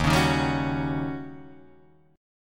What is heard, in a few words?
Eb 7th Sharp 9th, Eb, Guitar, Open D; 1,4,1,1,4,4